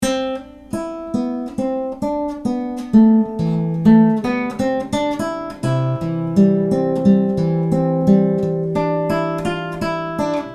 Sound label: zoom a3 nylon string mic